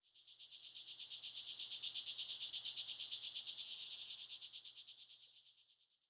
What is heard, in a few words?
cicada; Ambient; Zeus